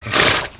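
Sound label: HORSE SNORT; Microsoft Office 95 Professional; VALUPACK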